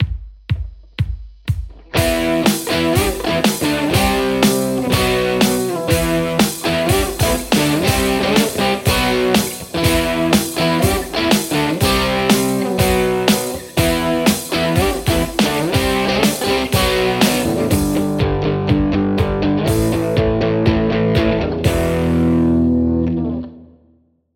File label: les paul; acme brain2